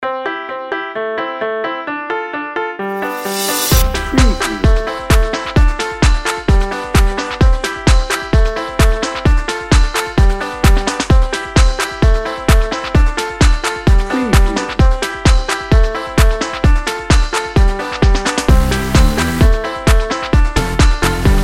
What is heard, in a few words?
beach jam bed